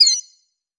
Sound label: bird killdeer